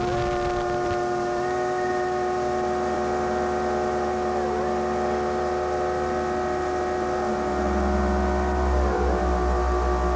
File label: hand nuzzling